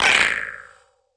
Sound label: bumps; Gonner